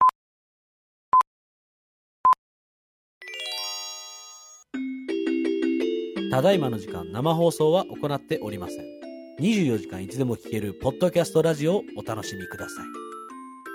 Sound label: jingle ending